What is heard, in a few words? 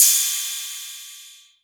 Cymbal, Novation Drumstation; DrumstationV1